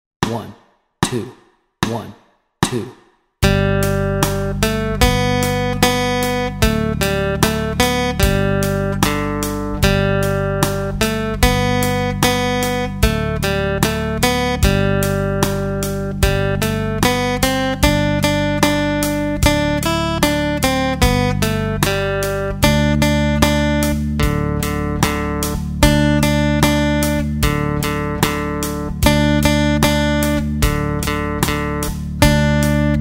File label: Alouette Melody Track, guitar